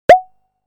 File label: Wasp-Drum